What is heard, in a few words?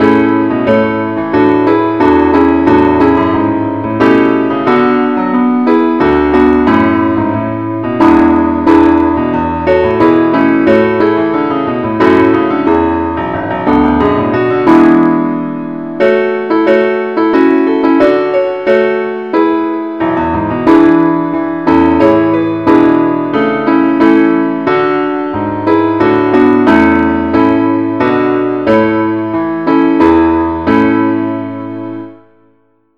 keyboard only key a